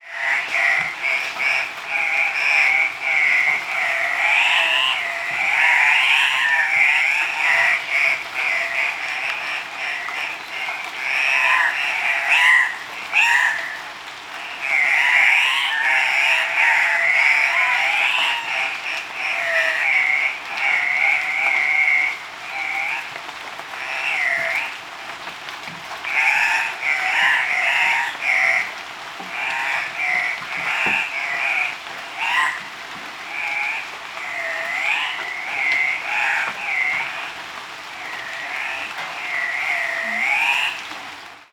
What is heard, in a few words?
Andean Cock-of-the-rock lek in the rain; 40 seconds